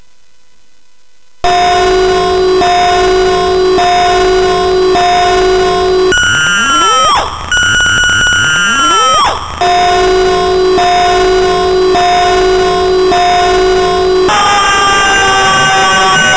Dont Cry, MOS6581R4; hvsc

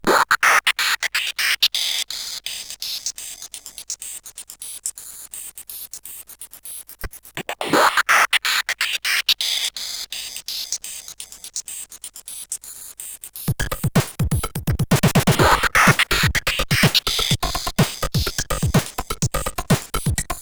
Drums, CSG8580

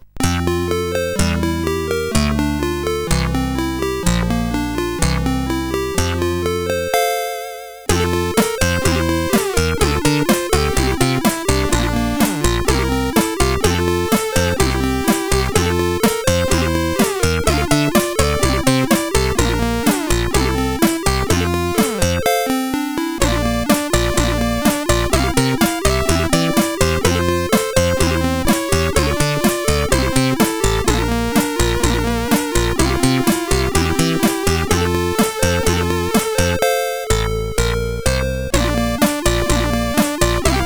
Keyboard CSG8580R5

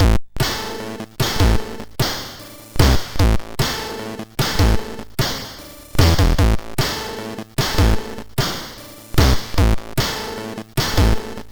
MOS6581

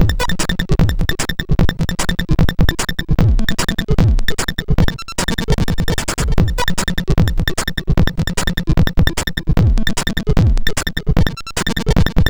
sid MOS6581R4

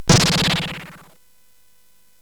SFX; Narco Police; hvsc

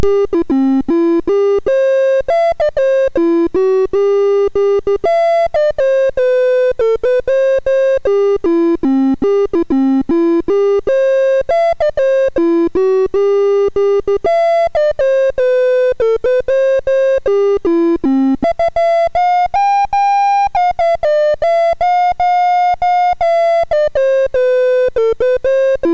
American Flag BASIC, MOS6581R4

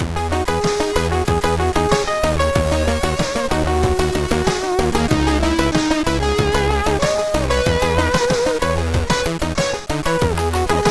Echoes, sid MOS6581R4

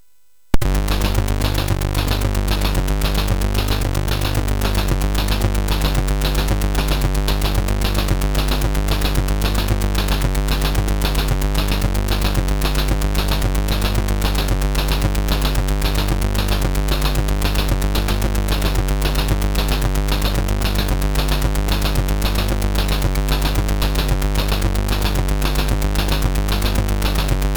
sid, MOS6581R3; Utter Ego Demo

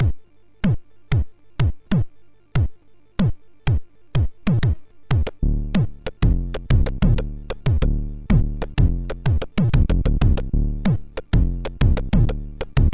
MOS6581R4 sid

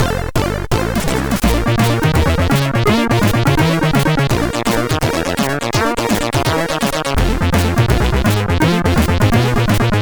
Menu, sid CSG8580R5; hvsc

